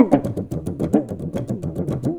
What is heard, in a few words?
PAPAN, Indian Traditions